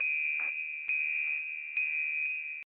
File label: Tone Alert